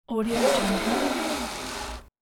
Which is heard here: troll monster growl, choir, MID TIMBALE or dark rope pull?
dark rope pull